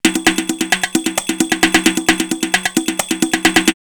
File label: 727 132, drumloops, DrumMachines; X-Static Goldmine; 90 s Sample CDs